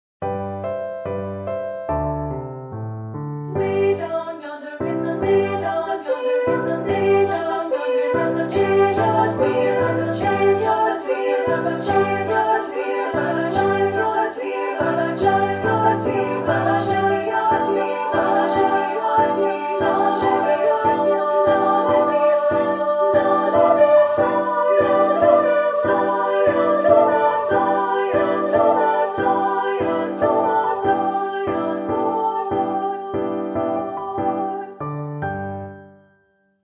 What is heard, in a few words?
With Piano Unison